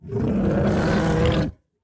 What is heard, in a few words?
Cry